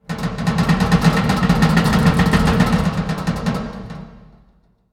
metal creak

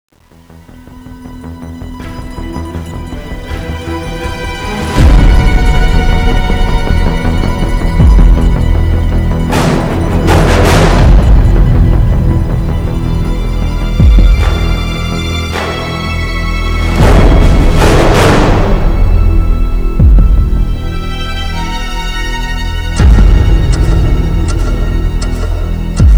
suspence

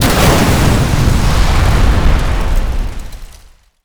boom

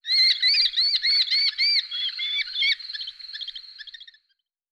hawk

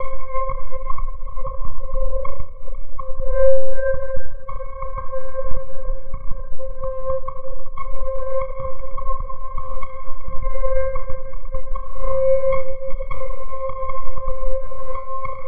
ambience

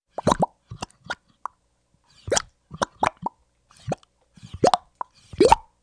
loop blurp pool

